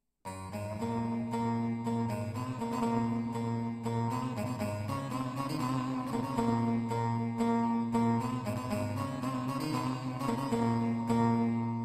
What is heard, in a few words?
korg pa 500 tr saz; zafer 1983